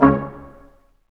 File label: BRS Quintet stac; Roland L-CD702; Quint.Stacc